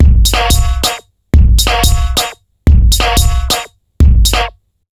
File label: bumpstarter 090bpm, Downbeat Dance Grooves; Zero-G